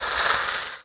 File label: hurt; mahlendur